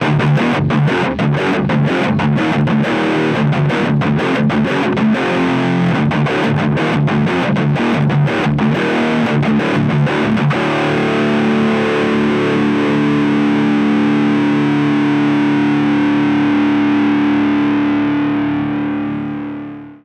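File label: distortion low, guitar; harley benton airborne go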